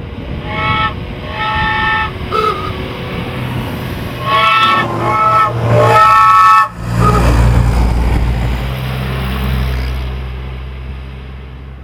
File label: Horn, oldtimer